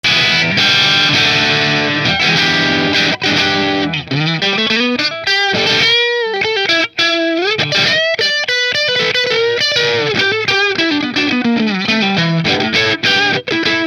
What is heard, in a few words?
Nola Voodoo 3 p90 driftwood neck middle marshall, guitars